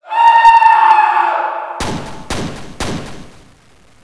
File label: fire